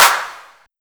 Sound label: Clap